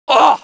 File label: damage, pain; dialogue; mohaa